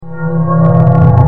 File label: stickybomb alarm loop; bebop; five nights at bebop s shadow bebop arrives